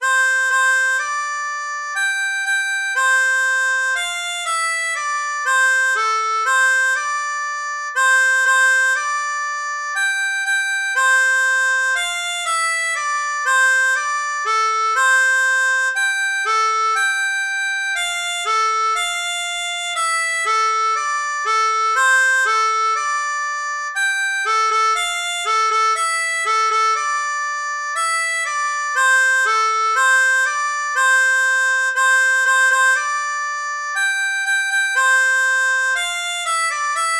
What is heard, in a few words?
Marche, harmonica